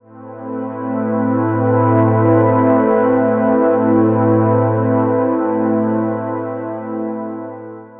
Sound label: shimmering ethereal ambient pad in am